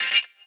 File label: C STAB; Loopmasters; Funky Underground Vol 2